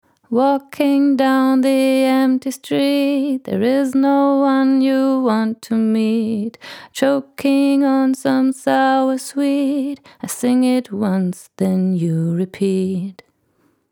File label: Female Vocal; Tegeler Audio Vocal Leveler 500